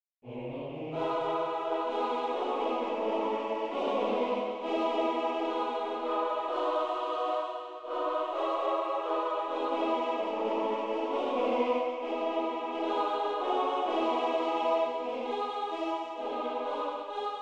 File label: sample swing low sweet chariot